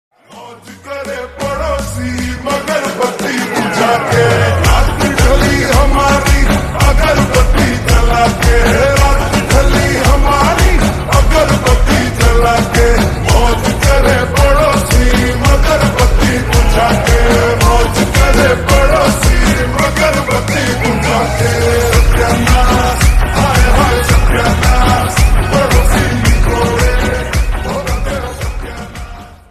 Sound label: Slowed Reverb Ringtone